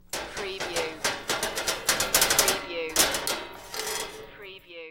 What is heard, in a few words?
Metal Drag Scrap Clanking